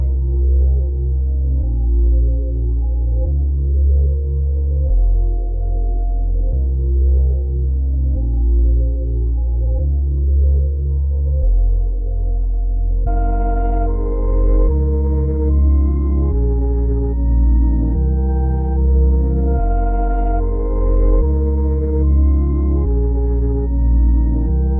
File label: 147 BPM F Min, Melody, RnB; Limelight; Cymatics; Cymatics - 2022 Melody Collection - RnB Pt 1